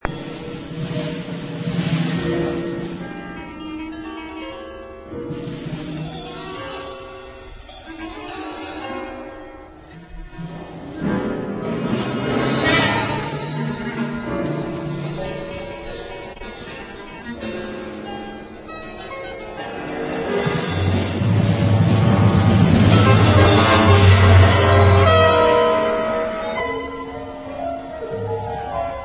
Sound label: Double Concerto